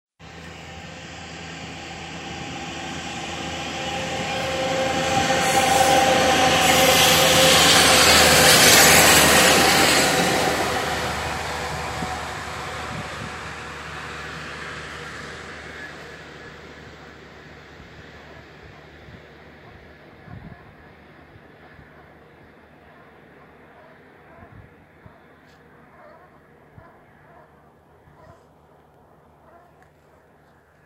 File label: Gypsy Bridge loco geese